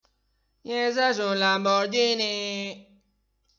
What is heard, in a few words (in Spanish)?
coche